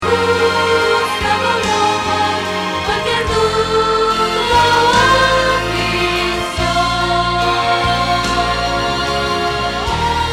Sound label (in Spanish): Contralto